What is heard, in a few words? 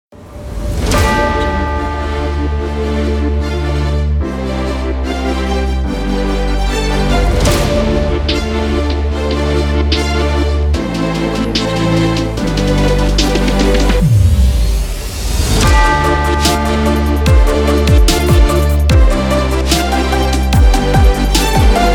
epic sport trailer